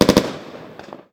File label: Rifle Burst fire